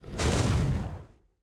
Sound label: Fire totem shoot